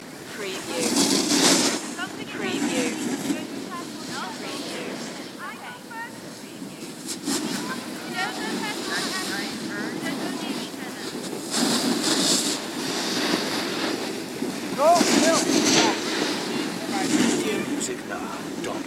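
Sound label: Ski Slope Ambience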